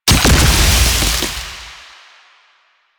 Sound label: grenade explosion; Warframe; Lasrian; Lasrian Soldiers; Weapons; Lasrian Goonadier Grenade